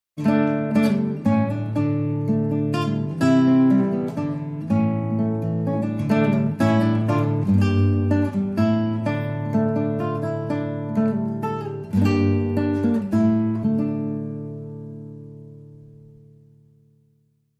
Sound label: Solo Guitar